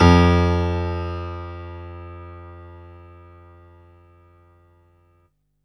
PIANO F1; Best Service Alpha Dance vol.2

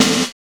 CYBER KIT, DRUMS

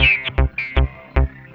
Teck Pulse, Bass; Sonic Foundry (Sony Creative Software); Synchro Funk

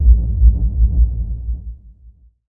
loop; X-Static Goldmine 3

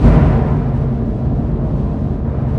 G#3 thud; Northstar - The Wizard VOL-1; TYMPHONIQUE

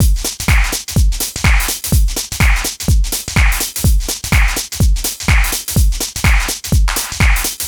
Full Loop 125, Loungin House; Equipped Music

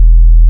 CLUB BS E2, CLUB BASS

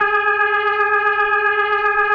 B3, First Call Vintage Keyboards

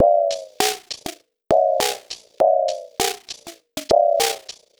Robo Beat 100BPM, sci-fi Beats

